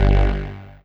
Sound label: Synth Hit G; Trance; Synth Hits; Zero-G Trance Inducer; 90 s Sample CDs